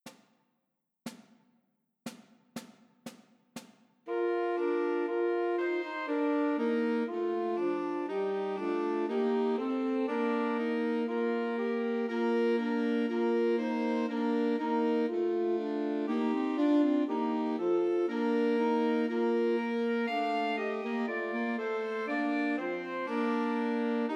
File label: Two Altos and Tenor Saxophone; Christmas Trios